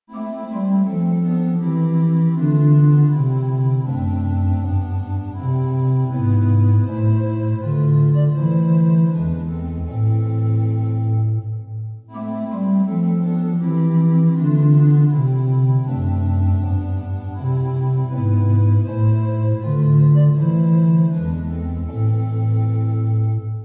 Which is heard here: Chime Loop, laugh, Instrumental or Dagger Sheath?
Instrumental